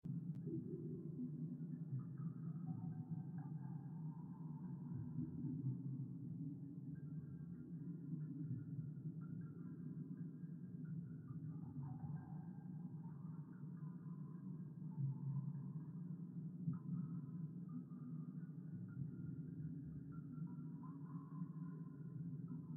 cave ambience loopable; out of reach treasure royale 2020 gamerip pc

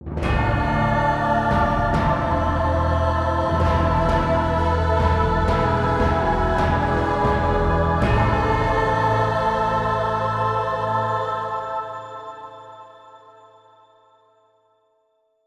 Lose; Dungeon Hunter Alliance; PS Vita; PS3

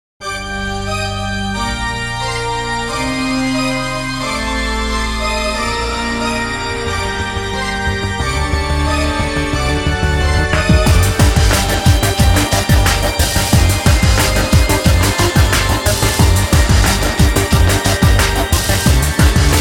Outro Fail; Dungeon Keeper 2

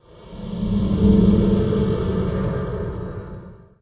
Ambient

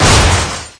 Vehicles; SFX Vehicles; Scorpion

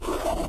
Slide; friday the 13th killer puzzle

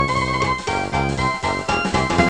Waterski; ABC World Reference 3D Atlas 97-98

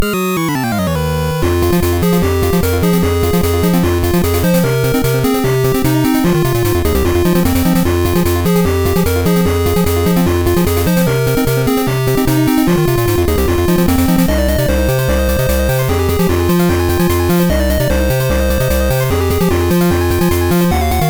Monster Battle; bomberman quest; gb

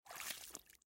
step in water

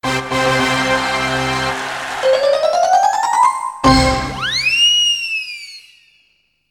Mystery jingle; hermie hopperhead scrap panic

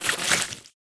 Mucus

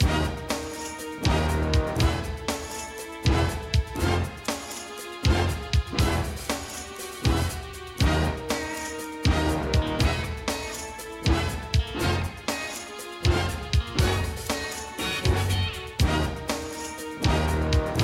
menu music; Spy Fox in Dry Cereal

